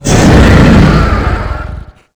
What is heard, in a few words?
dragon hurt